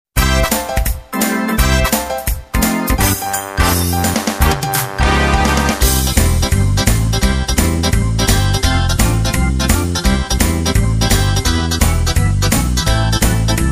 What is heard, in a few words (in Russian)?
fonogramma